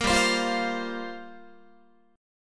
G Minor 11th G down Guitar; strummed; 4A,4E,5A,5D,5G#,6C#; Gm11; 13,15,15,15,16,16

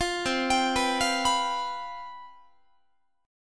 C Major 7th Suspended 4th, C, down, Guitar; arpeggiated; CM7sus4; 20,20,22,21,21,22; 4A,4E,5A,5D,5G#,6C#

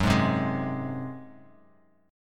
Gb Suspended 2nd Flat 5th Bass Standard; Gbsus2b5 strummed; 14,11,10,13

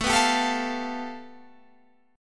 A 7th Suspended 2nd Sharp 5th; x,24,21,24,21,24,23,x

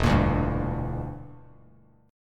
E Minor 7th Flat 5th E down Bass Standard; strummed

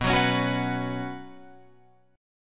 B Suspended 2nd, Guitar, Open F; strummed; JGuitar

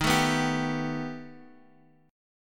B Augmented 7th D sharp

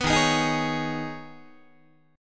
Eb 7th Suspended 4th Sharp 5th Eb, Guitar; strummed; chord

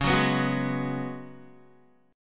C sharp 7th Suspended 4th C sharp, Guitar; C#7sus4; strummed; tuning=4F,4A,5C,5G,5C,6E; guitar chord

